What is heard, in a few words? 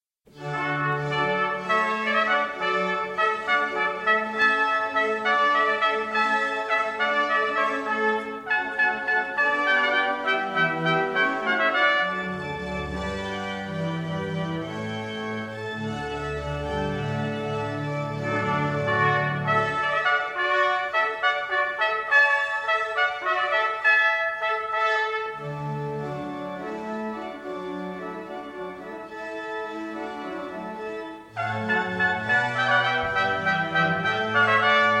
Hornpipe